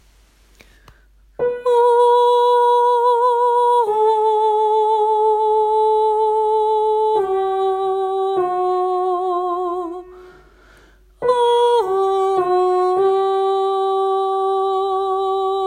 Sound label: mary tenor; åfjord